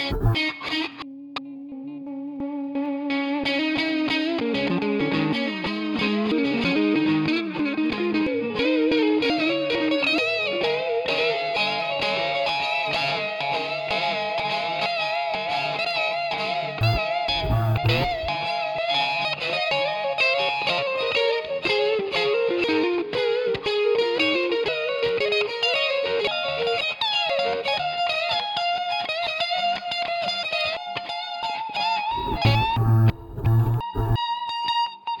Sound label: Guitar Remix